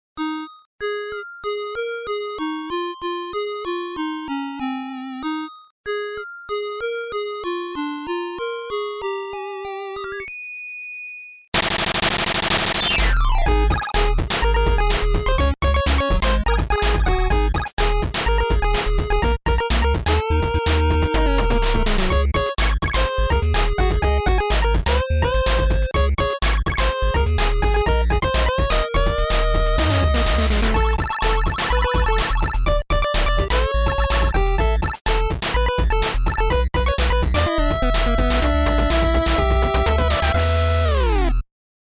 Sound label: Hush Now, Quiet Now (8-Bit); Everfree Radio